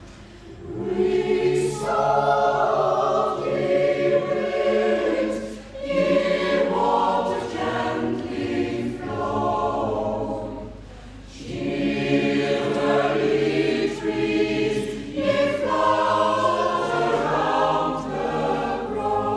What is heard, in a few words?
Breathe soft ye winds; uitvoering ruitersbos